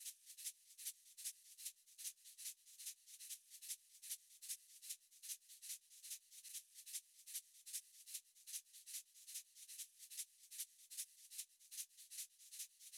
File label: drum loop 148 BPM shaker; Loop Stems; Cymatics; DOPE DRUMS VOL. 1; Friday Drum Loop